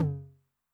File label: MOD Noise, 909mod MID TOM